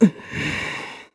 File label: Create Force Field; creating a force-field; Impa; Skyward Sword